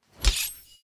Knife Pull; soundscrate

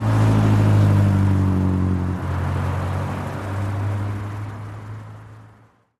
car passing

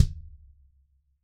bass drum

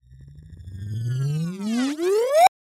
Laser Charge Up; SoundsCrate

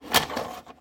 VHS takeout

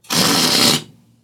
balloon deflate short

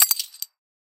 coins drop; soundscrate

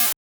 electronic snare 8bit; soundscrate

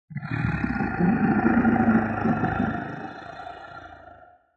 T rex growl; soundscrate

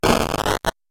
game glitch

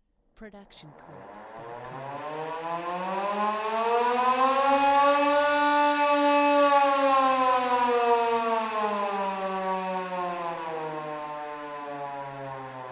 police siren

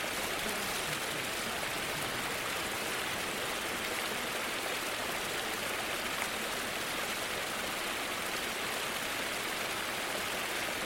loopable stream light; soundscrate

